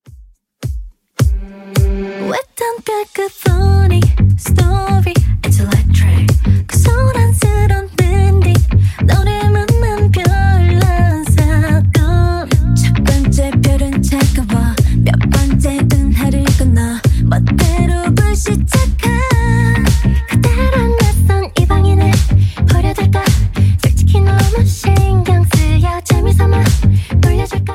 Top40 Redrum, 106 bpm

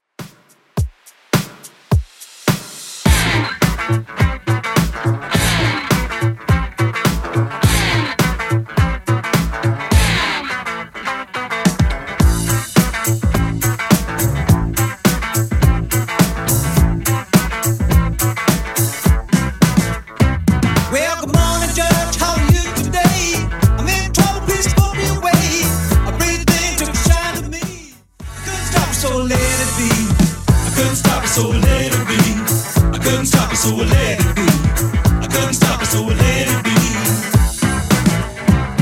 70s Rock Redrum 105bpm

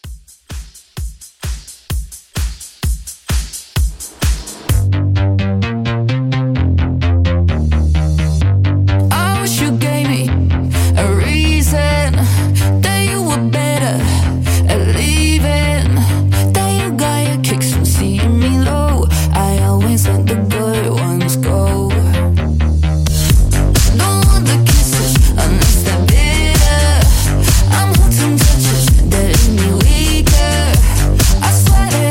Good Ones Mixshow Re Drum 129

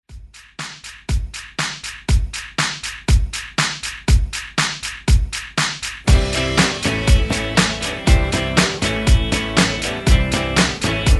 70s ReDrum 121Bpm; DJDOLLS

